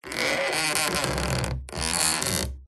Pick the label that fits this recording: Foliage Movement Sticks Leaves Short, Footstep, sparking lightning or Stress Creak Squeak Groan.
Stress Creak Squeak Groan